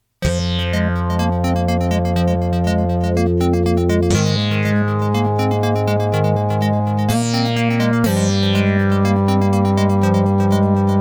synth explore

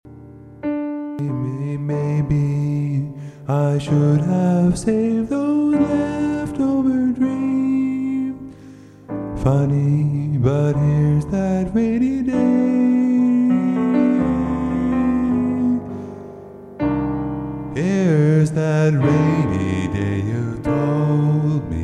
vocal practice

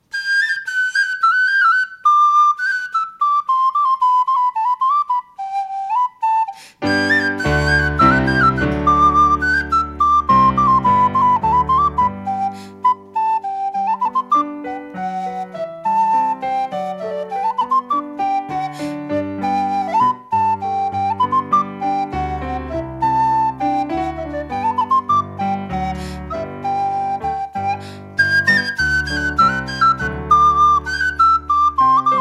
recorder and piano

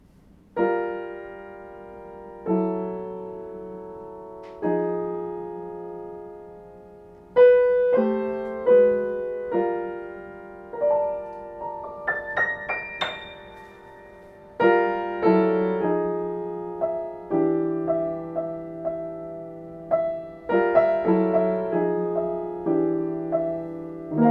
Solo Piano

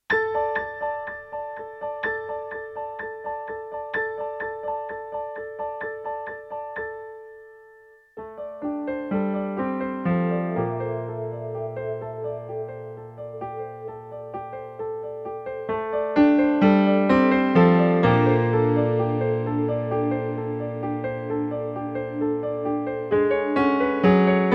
piano and synth

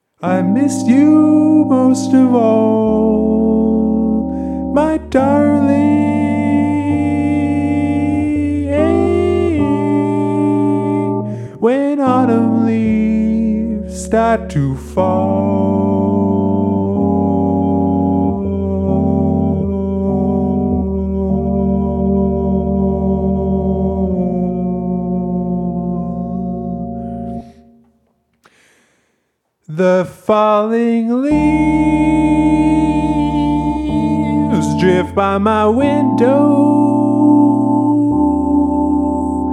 Voice and Synth

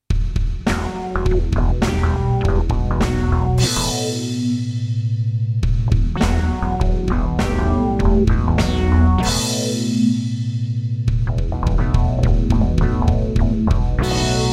Drums

